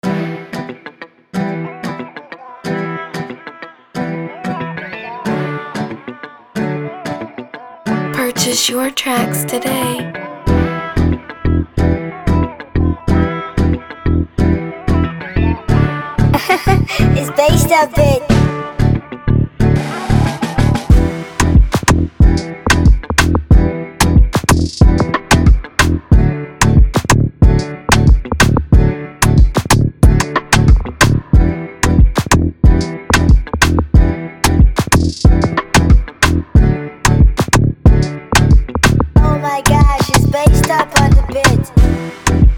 beat Dancehall 92bpm G major

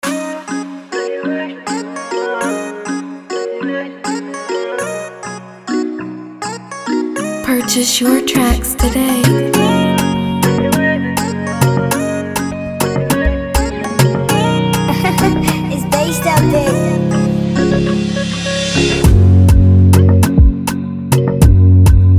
Freebeat

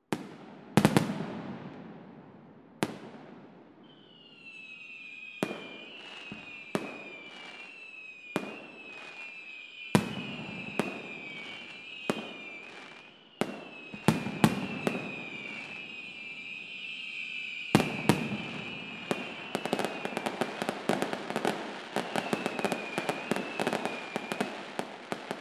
Fireworks; bigsoundbank

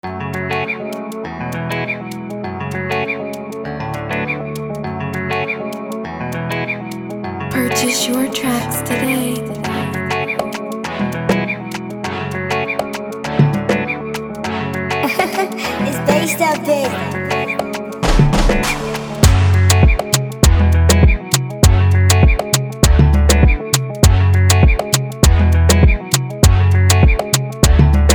100 Bpm A major, Afrobeat Instrumental

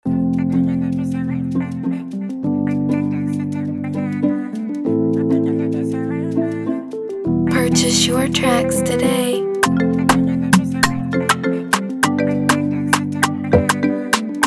Freebeat 100 bpm C major

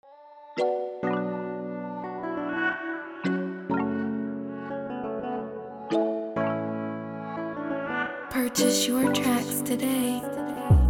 beat 90bpm D major

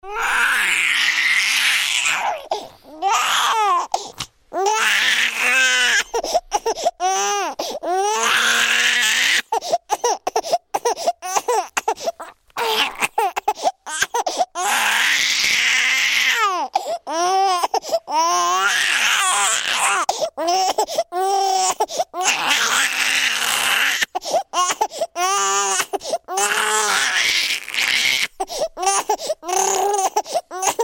New Born Baby Crying sound effects

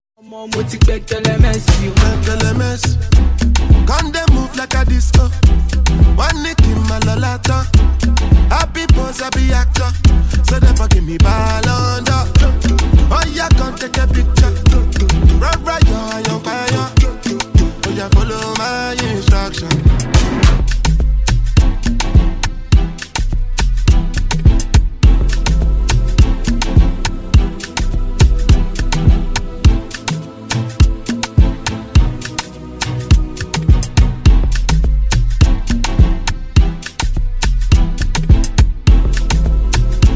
Instrumental; hook

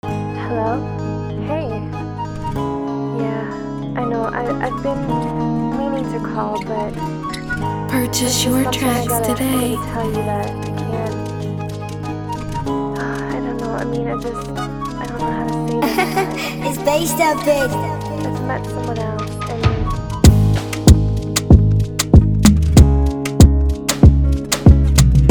Free beat 95bpm B major

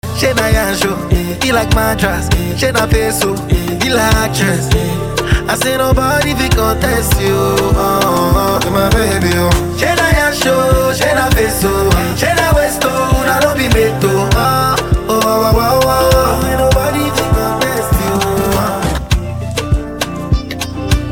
Instrumental

